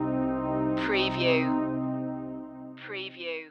static games stinger

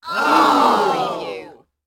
Crowd Oh

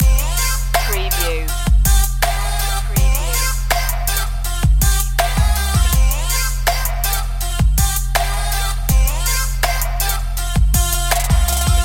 trap this loop

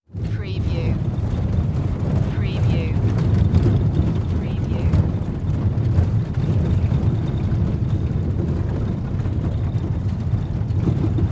Auto Drive Interior; sound effects bible

